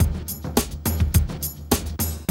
Loop, Roland; Sound & Vision - Gigapack I

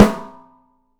OPEN PICOLO, 3D Drums

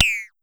Pollard Perc, Syndrum

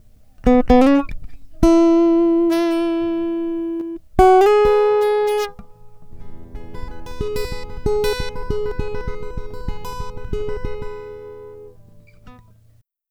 Guitar, Trip-Hop Loops

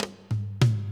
perc, drums; mercure drums

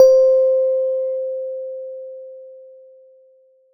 PNO C5, Yamaha TX7 synth keys

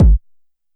BD Analog Comp; YAMAHA RM1X

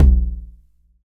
Tom L, Roland MV8800; DIRTY 9

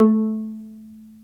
P VIOLA A3, SOLO PIZZ; Greytsounds VOL-1

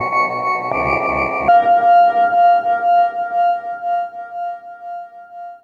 piano creeps, Drum N Bass; Sonic Foundry (Sony Creative Software); Knutrix Drum & Bass

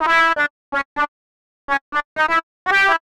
125 Fluty Lick E, Keys, Nu Jazz House; PowerFX - Nu Jazz House

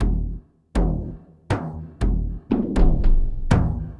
Throb Drm; Sonic Foundry (Sony Creative Software); Friendly Fire

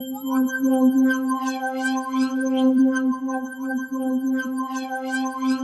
C4, SynthLine; 90 s Sample CDs; NiceBeats; SynthLine VOL-5

